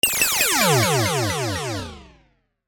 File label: sound design effect bright star; sound effects one